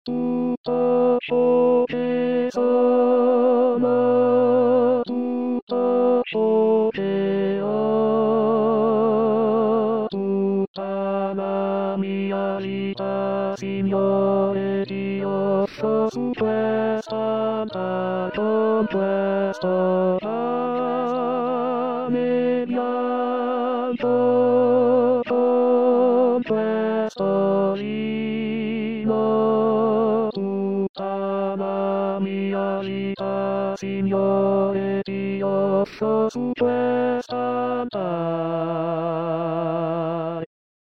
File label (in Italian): 4 voci tenori